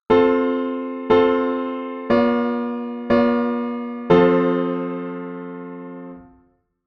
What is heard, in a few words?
plagal cadence; 16 edo theory; 16-ET